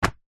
book crossword thin pages drop or throw down on soft surface; household